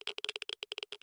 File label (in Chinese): 玄武岩三角洲咔嗒声; ambient